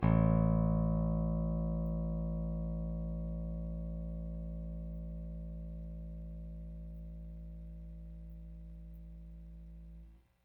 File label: Rickenbacker Bass; Airwindows